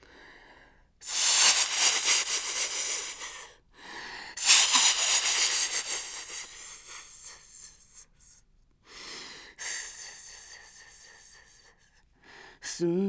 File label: Snake